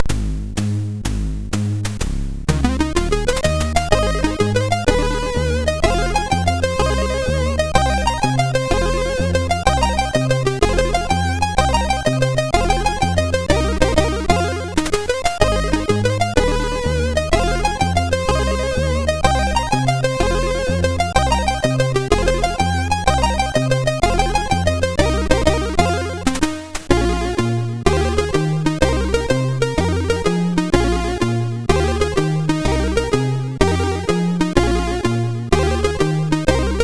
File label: Jazz, sid MOS6581R4